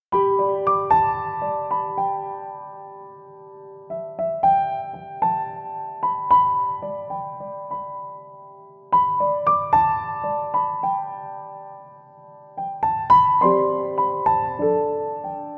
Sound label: Instrumental